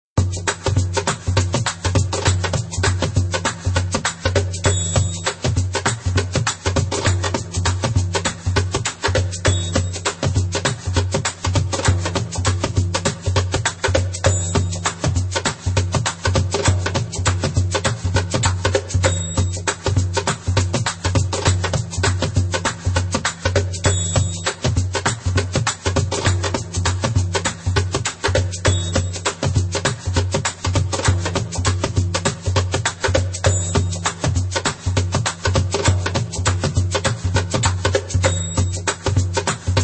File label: Darbuka Second Line (fast)